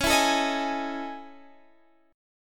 A Major Sharp 11th C sharp

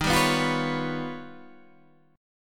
Eb Augmented 9th, Guitar Standard; Eb+9 strummed; 11,10,9,10,12,9; JGuitar

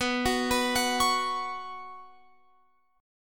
B Suspended 2nd B Guitar Open F; Bsus2; arpeggiated; x,18,18,18,21,20

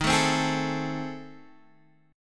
A Minor Major 7th Flat 5th

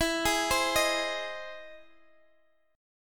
E Augmented Major 7th E down Guitar Standard; arpeggiated

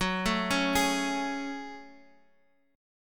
F sharp Minor Add 9th, F sharp, guitar chord; arpeggiated; madd9:1,b3,(5),9; 14,12,11,13,x,x

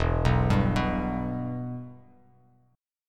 E-Minor, Bass Standard; arpeggiated; JGuitar

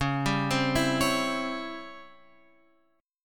C sharp Minor Major 7th Flat 5th, guitar chord; C#mM7b5 Chord {9 10 10 9 X 9} arpeggiated

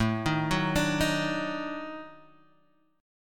Eb Minor Major 7th Flat 5th

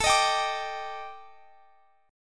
Eb Major 7th Flat 5th A, guitar

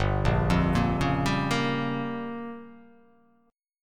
G Minor 7th Flat 5th

